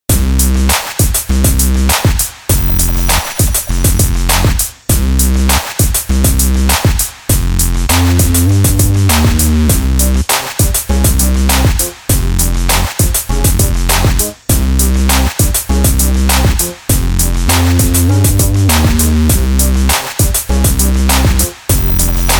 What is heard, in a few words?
hip hop